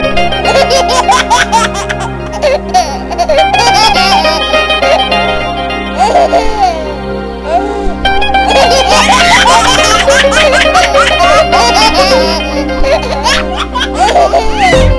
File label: babylaugh; children